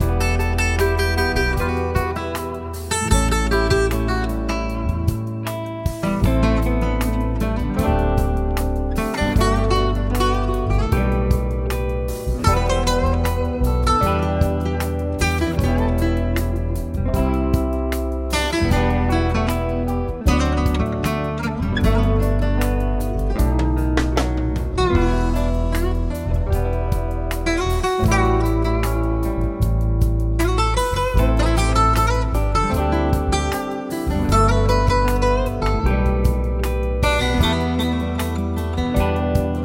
Spokojnaya gitarnaya muzyka orkestr

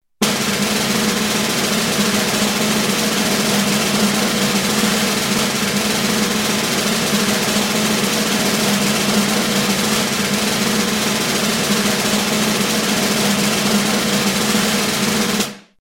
barabannaya drob; kuhnya

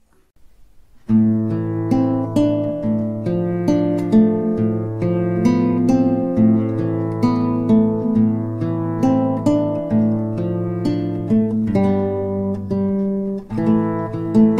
Gitara u kostra; Tema iz Metro 2033

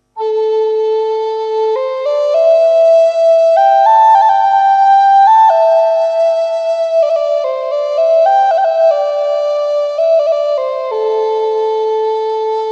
Flejta

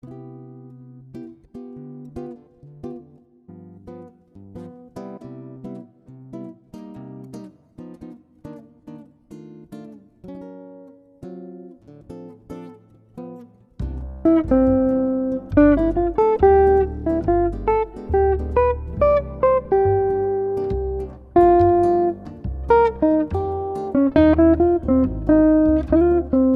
Klassicheskij dzhaz; Restorany

